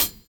VINYL HH, HH CLSD, Drums Dance & Groove

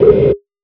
Hats, Alesis Performance Pad

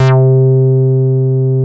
SQUARE, BASSES; Masterbits; Vintage Voltage

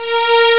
string up; Amiga Soundtracker Sample Packs

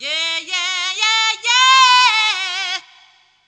Sing Voice Yeahs

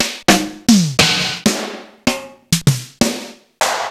Akai XR-10 Snare drums

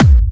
Trance Kicks; Bassdrums; Vengeance - Essential Clubsounds Vol.5

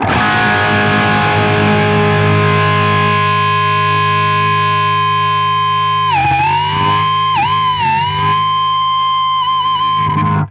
POWER G#, guitar power chords; East West; Steve Stevens Guitar Samples Collection

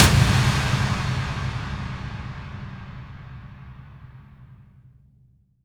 Impacts, Effects

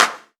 Claps; Vengeance - Essential Clubsounds Vol.5

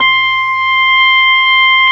ORG B3, organs; Sound & Vision; Gigapack I CD 2

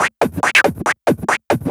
Loops 140 BPM Hihat Percussion Loops

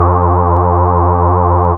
BAS, Eurotech; Sounds Good

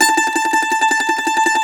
MAND TRM, Mandolin

